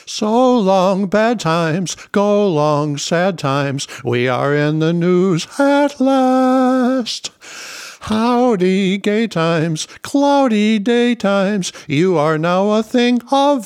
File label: Vocal Take Two Happy Amp Comp